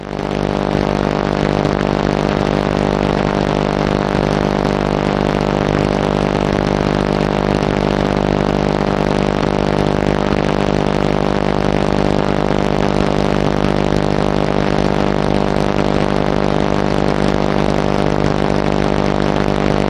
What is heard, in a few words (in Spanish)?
ruido